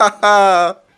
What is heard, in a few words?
ha ha; Voice; ooh ahh